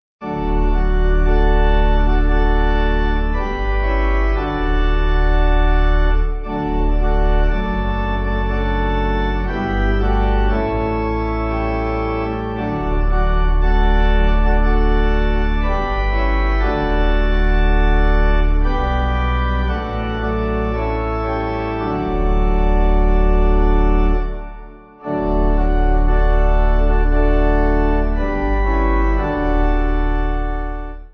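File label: Pipe, Pipe Organ backing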